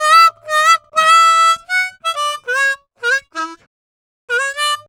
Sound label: Harm 098bpm A, Harmonica